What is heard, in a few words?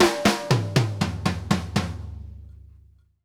Drumworks SP fills 120